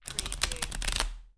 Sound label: Playing Cards Shuffling Cards Flipping Onto Table